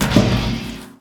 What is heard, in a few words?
weird switch